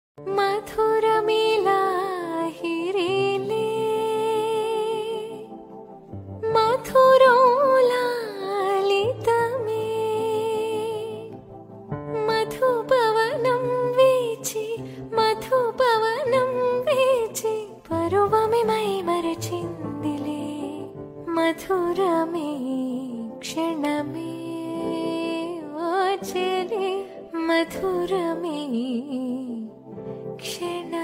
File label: Telugu Ringtone